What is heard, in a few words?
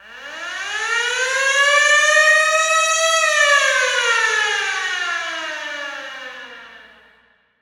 Hockey Goal; Hockey Horns & Buzzers; Calgry Mntrl Tmpa; SFX Kit